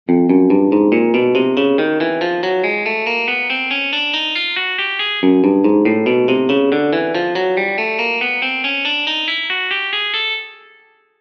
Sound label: chromatic